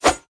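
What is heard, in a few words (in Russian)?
показательный взмах мечом; mechete pered kaznyu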